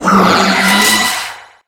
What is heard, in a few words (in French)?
Cri; USUL